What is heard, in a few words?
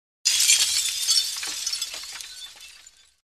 Glass Falling Steady Steady Light Rain Of Falling Glass; Hollywood Edge Foley Sound Library